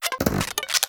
Fall Down

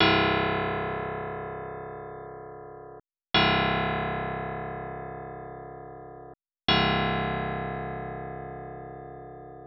extension piano

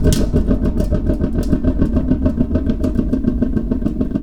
Rotate

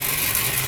Rubbing Scratching Papier Verre - Metal

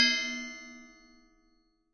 synth

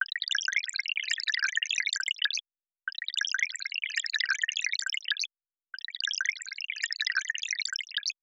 extension drops

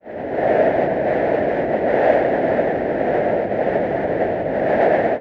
synth Circle

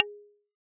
synth

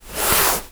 Rubbing Scratching Housse Canap

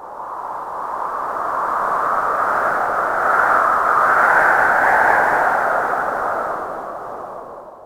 wind

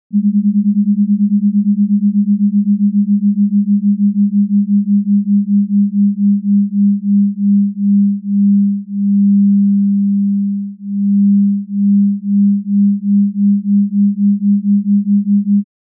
Fluctuation Strength

